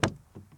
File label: Truck Durango Door Open; Trucks